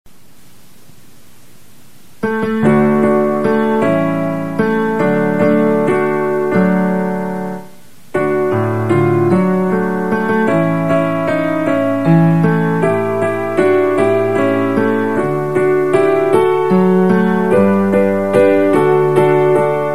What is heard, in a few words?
u music